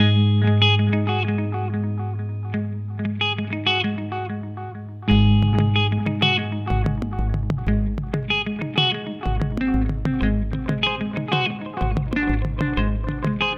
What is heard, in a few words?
MXR Carbon Copy